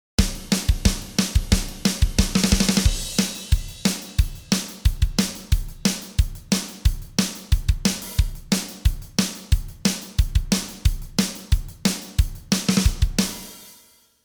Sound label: drum solo on